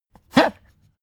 pug gag breathe short; natur-tiere; hunde

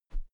whoosh feather slow; swooshes-swishes

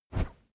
whoosh wood cornered whizz slow

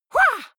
female fight shout attack short; comedy-cartoon; soundpool

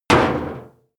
impact metal sheet short; soundpool

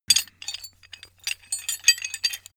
metal handling pieces

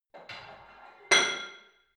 barbell plate put on hit ring reverby; sport freizeit; fitnessstudio

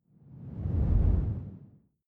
whoosh simple long low; swooshes-swishes

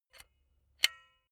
silicone gun bullet lock mechanism regular; berufe; handwerker; soundpool

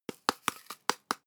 season

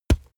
boxing gloves parry punch; sport freizeit; boxen

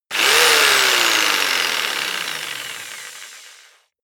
jigsaw engine fast short; maschinen; saege; soundpool

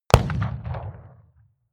revolver shot 44cal remington 100m

